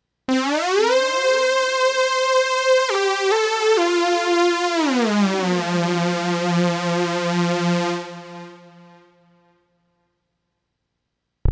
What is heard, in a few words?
Synth